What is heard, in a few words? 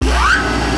Conveyor; Working Model Program